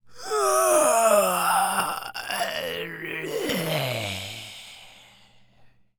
Male Taunt, Zombies; zps; Male1

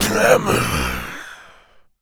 Pain, Zombies; zps; Carrier

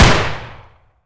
usp fire; zps; Weapons; Firearms

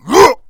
Melee Grunt, Human Cop; zps; Humans; MeleeGrunts

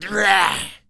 Male Attack, Zombies; zps; Male1

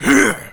Jump, Zombies; zps; Carrier

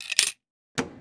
vending press; zps

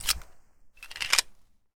ppk reload; zps; Weapons; Firearms

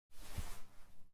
Grenade Underhand Toss; zps; Weapons; Explosives

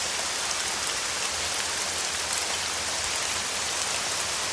Rain Leaf Grass Medium; zps; Ambient

